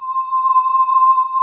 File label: C5 MULTI, CRYSTAL SYN; AKAI S6000 CD-ROM - Volume 1; SYNTHESIZER